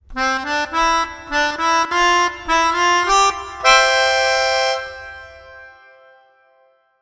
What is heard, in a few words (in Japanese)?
ピアニカ単体